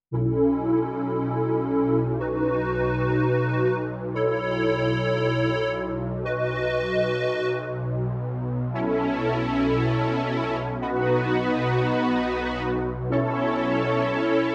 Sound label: pad square dual filter close then open, Clavia Nord Wave